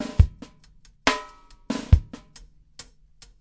070 bpm superslow ghost, drum loops and breaks

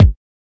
kik, kicks

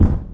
Boomy KIK, dirty hip hop; Dirty Hip Hop Kit; style kits

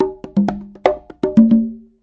090 bongos, 090 bpm; Bazillions of Bongos

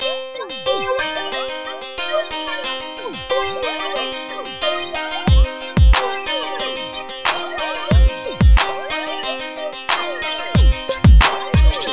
beat

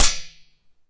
Metal Sheet, Found Percussion Hits; UNUSUAL KITS and FX; ModeAudio

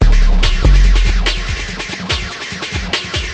143.6 bpm acid out, drum loops and breaks; 141 to 160 bpm

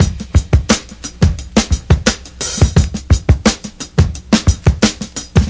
classic break, drum loops and breaks

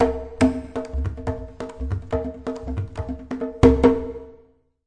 epic congas, drum loops and breaks; 141 to 160 bpm

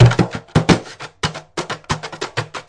DRUM LOOPS and BREAKS, 090 bpm; futzing around

